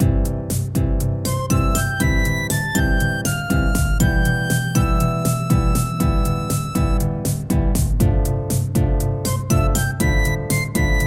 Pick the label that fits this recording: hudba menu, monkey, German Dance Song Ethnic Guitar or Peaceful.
Peaceful